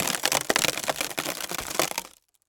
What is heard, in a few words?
wood cracking